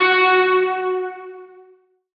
tonal oneshot F#; Pop; Slate; PASADENA Pop Sample Pack; Tonal One Shots

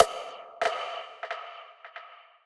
percussion one shot; rocky; Slate; SD PASADENA Pop Sample Pack

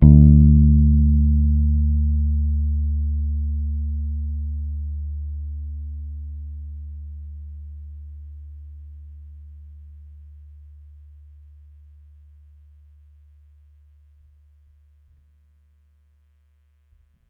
Bass D, Electric Bass One Shots; Slate Digital BASSHOLE Sample Pack

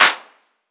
Clap Arena, 909; 909 Claps; Slate; Vintage Drum Machines Volume 3 909 Edition

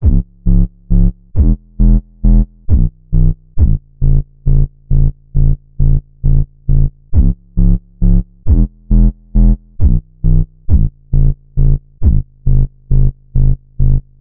Synth loop 135 C#m, Synth Bass Loops; Slate Digital BASSHOLE Sample Pack

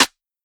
perc rim; Hip-Hop; Drum One Shots; SD CAICOS Hip-Hop Sample Pack; Slate

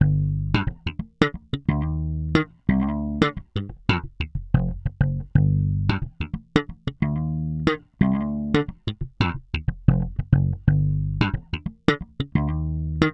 Bass loop 90 Gm, Electric Bass Loops Direct; Slate Digital BASSHOLE Sample Pack

